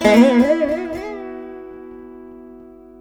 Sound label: VEENA; Spectrasonics; Heart of Asia